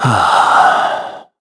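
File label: Human Sigh, Korean; heroes; Shakmeh; kings raid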